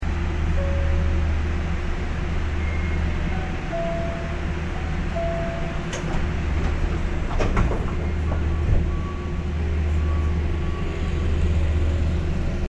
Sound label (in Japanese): 電車のドアが閉まる音